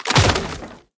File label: Hold the door Door break